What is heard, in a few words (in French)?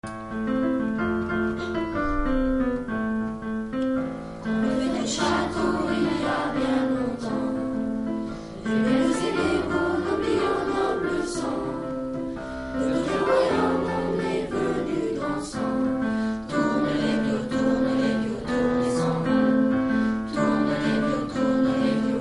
Violons; Classes de 5ème